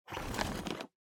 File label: Sensu Open